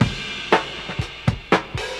Old School Break, Drums

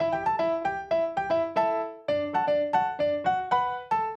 Acoustic Piano

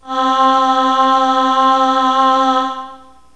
aahs; proteus1

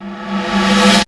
flush; SoundFactory; SMPMANIA; EFFECTS

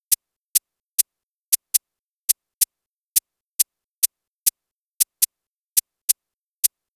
Electronic Percussion

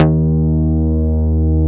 MOOG BASS; SoundFactory

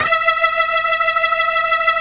Organ

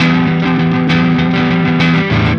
Electric Guitar, Loops; Guitars

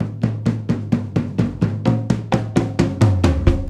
Acoustic Fill, Drums

